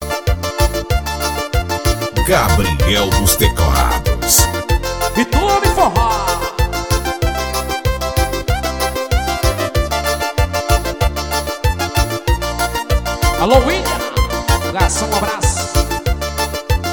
teclados ao vivo

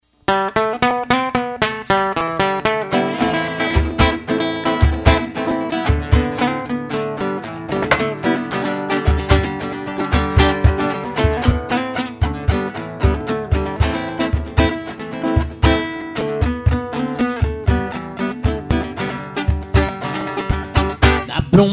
forro

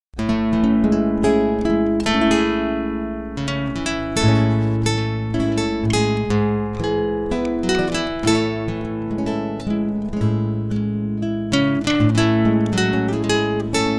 abertura

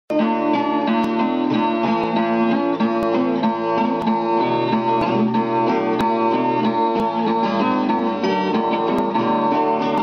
pagode

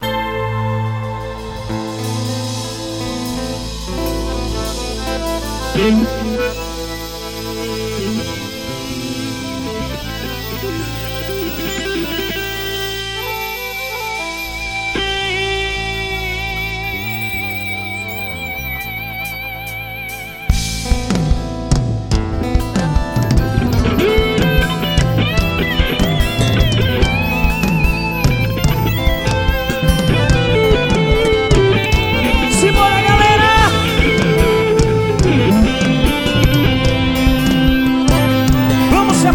abertura

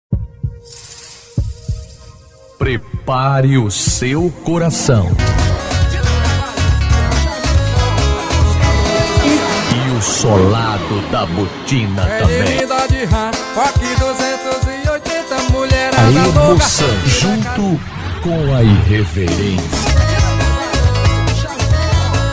abertura

